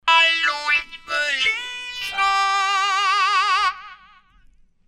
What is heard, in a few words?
Dunlop MXR M222 Talkbox tone gain 0